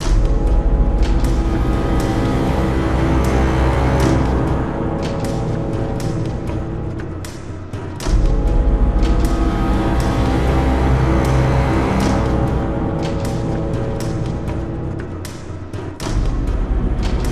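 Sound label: PERCUSSION loop; gamerip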